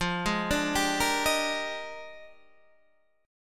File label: Bb 13th F